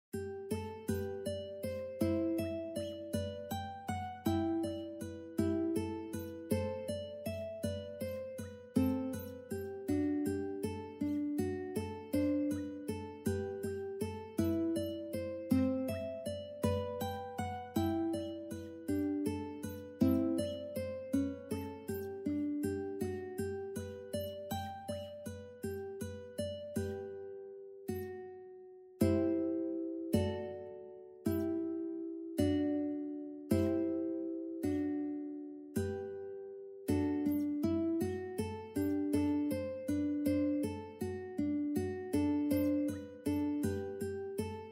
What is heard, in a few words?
uke jesu joy of man s desiring; ukebook